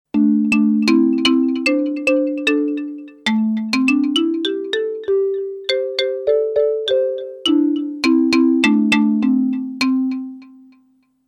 Marimba LEAD; Artiphon ORBA 2

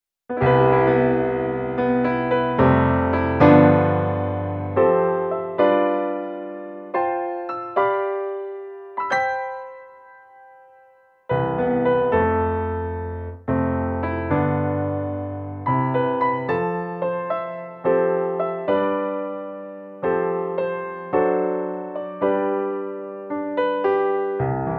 Nord Piano 3 Italian Grand XL

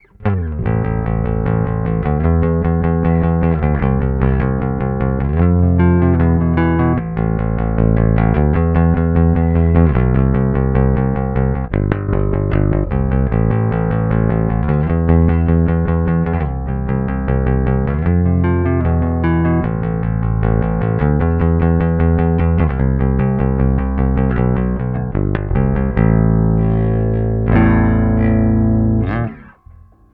Rock pick

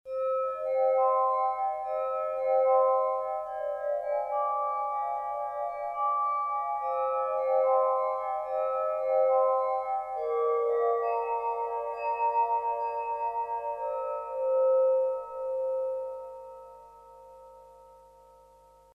Klang Imbibaphones Wineglass; Reason 10

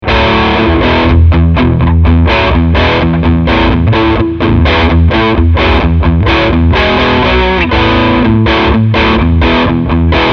Crunch Riff

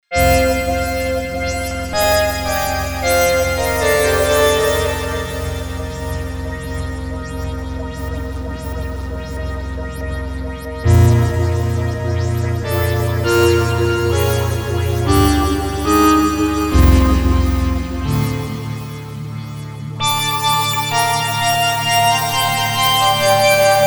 Waldorf Quantum

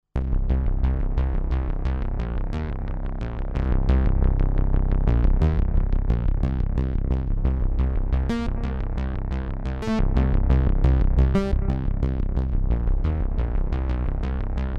Wide FM Bass

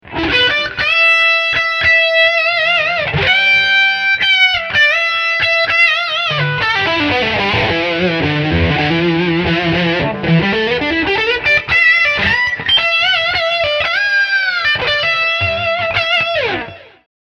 Lick Rear Humbucker

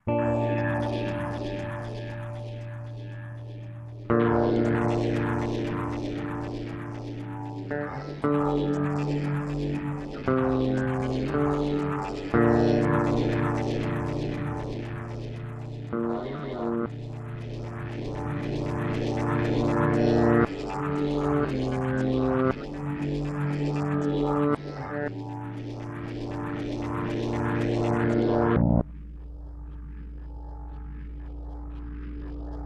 EHX 1440 Stereo Looper

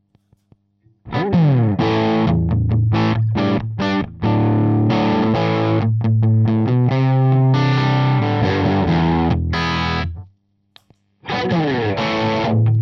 AZ Gain Tone GLASS

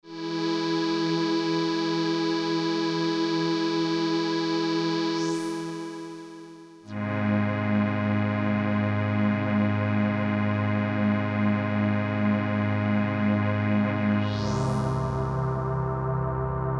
Kijimi quad triangle; SRE330

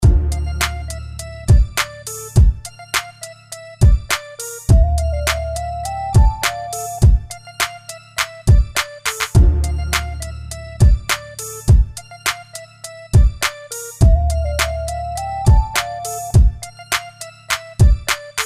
Urban Flava; Complete

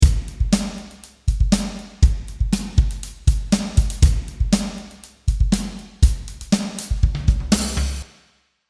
Drumset EMT140

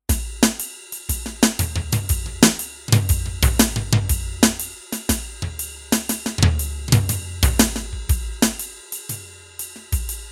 Groove

